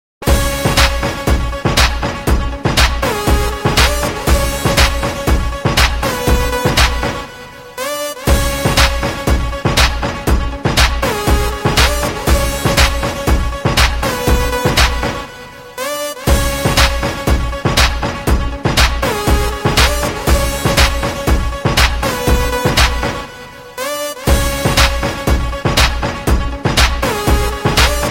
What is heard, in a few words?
beat rap